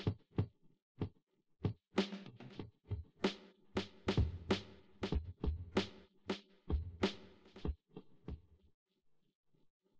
wdrums inst sim

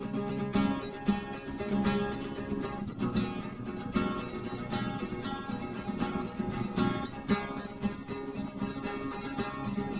no drums inst src; SiSEC08; SiSEC underdetermined

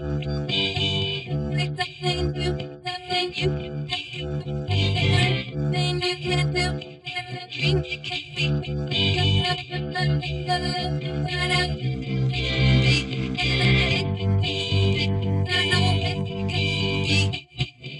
bass; SiSEC11

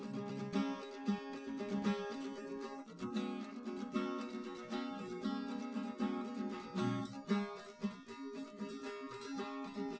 nodrums inst sim; SASSEC07

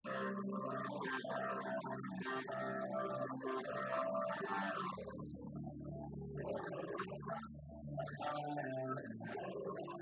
nodrums; SiSEC10; underdetermined

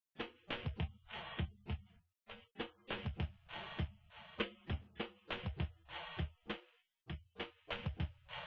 wdrums inst sim

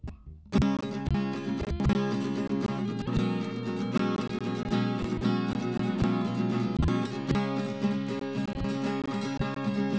nodrums inst sim; SASSEC07

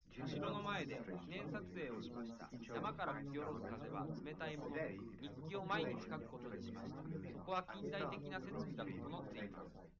male live rec 5cm sim; SASSEC07

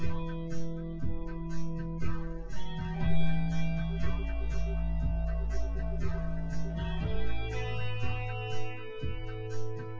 wdrums synthconv 250ms 5cm

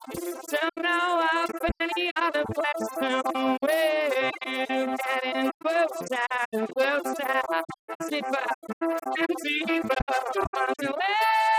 vocals; SiSEC11

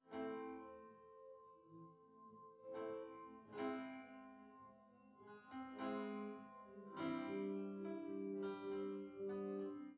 nodrums

